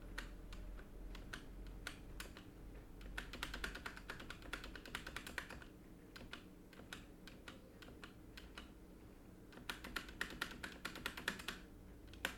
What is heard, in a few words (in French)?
bruit clavier Corsair K70 Core touche espace; test clavier corsair k70 core